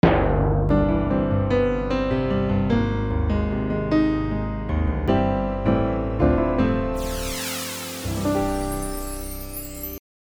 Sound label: Jingle; Rowhouse Cinema